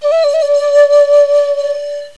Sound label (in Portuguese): FLAUTA